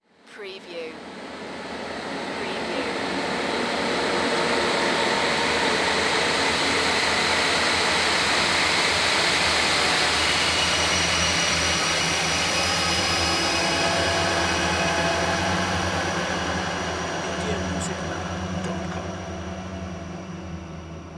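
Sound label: Production Element Swell Terror; Sound Effects Bible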